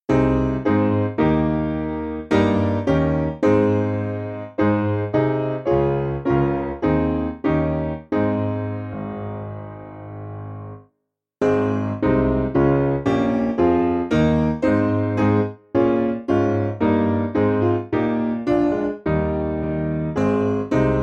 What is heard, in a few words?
Piano